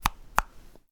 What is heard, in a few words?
Apple Flicking